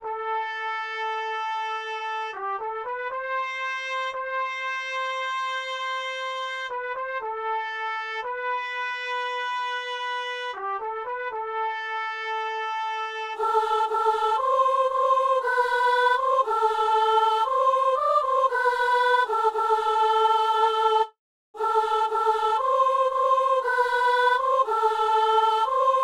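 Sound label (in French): célébrant et soprano assemblée; Messe de Saint-Paul - Doxologie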